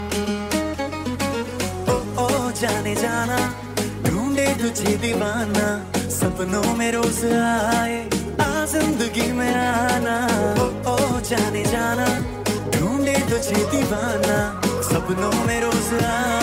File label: best bollywood music ringtone